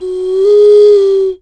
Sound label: bird calls, percussion